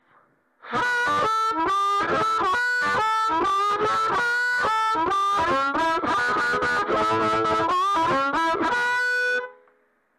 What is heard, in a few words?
mid gain